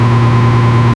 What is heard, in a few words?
clean low rev engine on, land cruiser